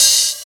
dark open hi hat sample d key, Royality free open hat sound tuned to the D note